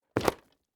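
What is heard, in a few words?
tentacle flop down, octopus; osminoga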